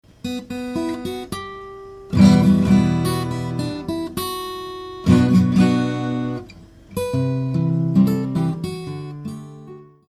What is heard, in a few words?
Guitar Accoustic